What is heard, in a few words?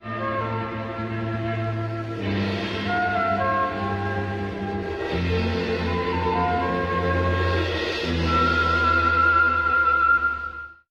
flute, Loops